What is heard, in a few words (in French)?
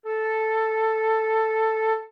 la flute